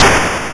YM Noise; NOISES; ChipShop Deluxe; ChipShop Hits

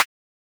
Closed hat, techno drum

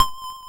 Vibra C3; multi-samples; Vibraphone; CHIPSHOP DELUXE

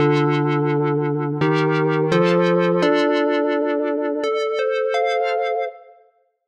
Keys Line 85, 85bpm, hiphop; musicradar

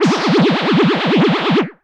Moog Warble; Single Hits

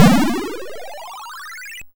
YM Jump Fly; SFX; ChipShop Deluxe; ChipShop Hits

